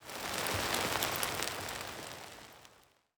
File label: impacts; World of Tanks